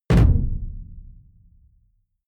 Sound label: sci-fi shot tonal; schusswaffen